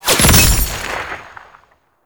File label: Kill Notifier; Warframe; NineteenNinetyNine; Quincy